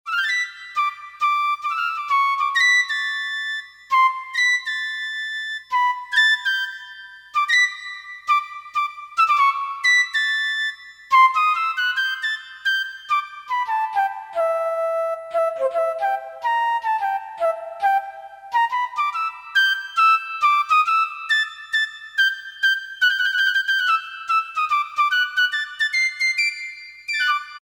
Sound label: Piccolo